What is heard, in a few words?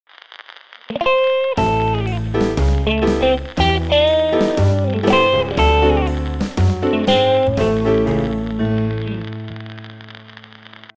sms blues